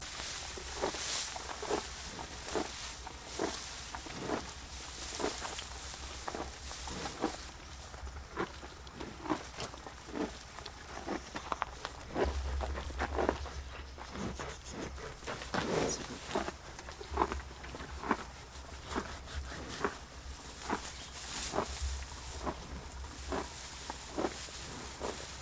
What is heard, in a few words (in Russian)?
zvuki nosoroga